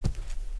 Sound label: player carpet; Group Mapping Project